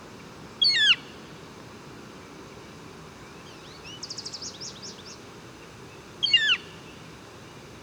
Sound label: Northern Flicker, Colaptes auratus; woodpeckers